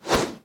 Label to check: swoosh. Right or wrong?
Right